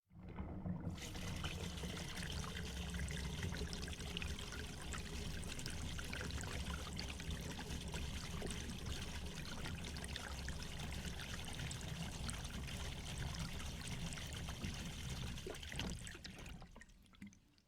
water drains in pipe

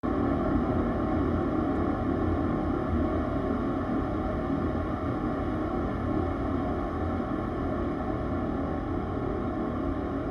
machine rumble

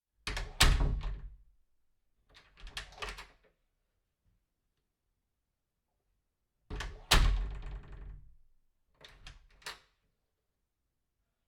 wood door close soft

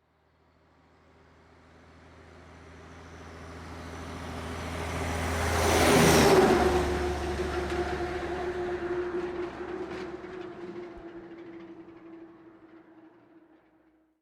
large truck passing on highway

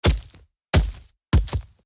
football kick in grass

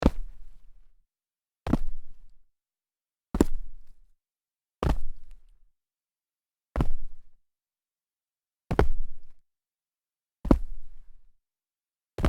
sneaker medium jump dirt

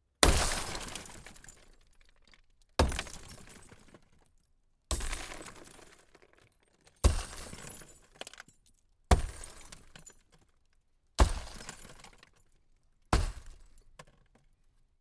smashing car window series

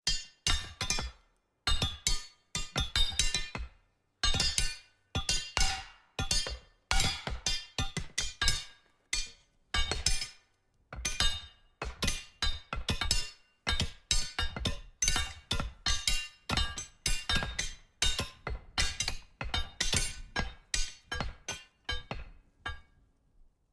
metal hits

